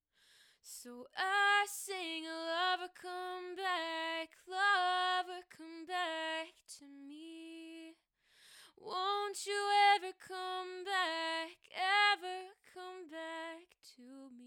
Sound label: singing microphone sample; beyerdynamic M70 Pro X